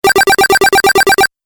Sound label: 8 Bit Style Collect Bonus; Video Game Sound Effects